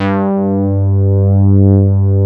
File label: MOOG G1; MICROWVE2